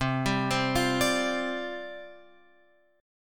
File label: C Suspended 2nd Suspended 4th C Guitar Open F; Csus2sus4 arpeggiated; x,7,7,7,8,9